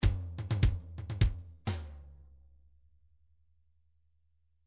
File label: fills pop fill